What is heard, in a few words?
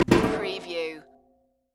Keg Bang